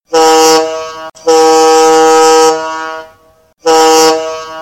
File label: one short one prolonged and one short blast